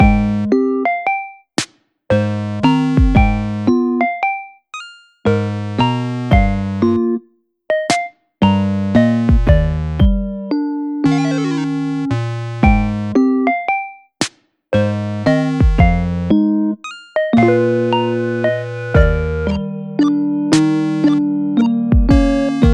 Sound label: rainy days loop